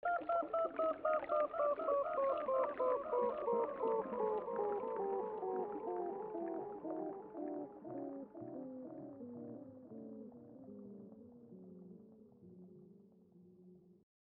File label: bubble down 2 octaves